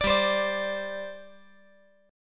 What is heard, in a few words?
G Suspended 4th